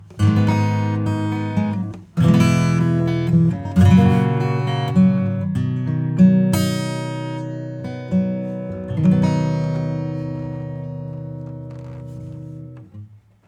acoustic; Kelvin

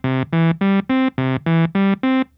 Nord Lead 4 arpeggiator up slow

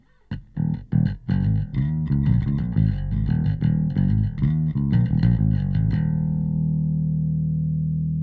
bass

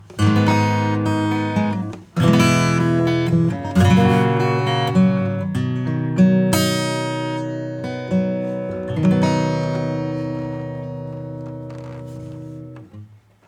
Acoustic

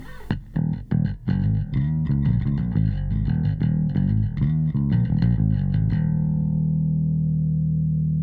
bass; Smooth

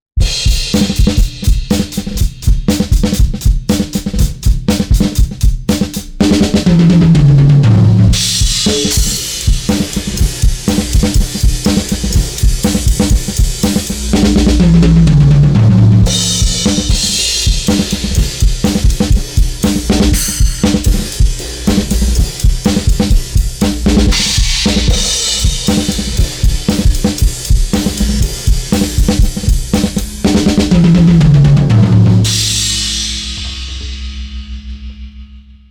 drums EQ; Titanium 3; Sofutube

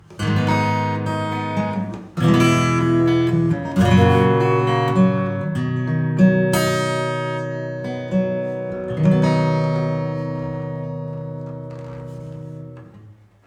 acoustic Concrete Room; Reverberate 3

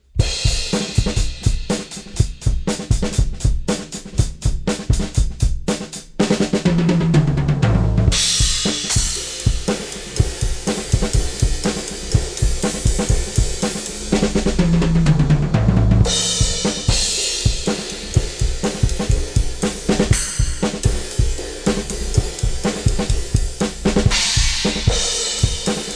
FULL KIT CLEAN TIGHT POP; MIXROOM

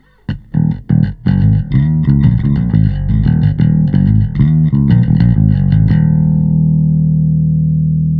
Bass

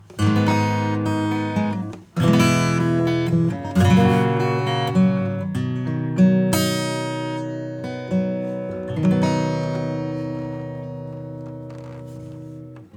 Acoustic

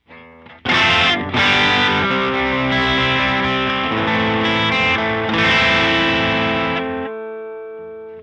Eguitar bypass

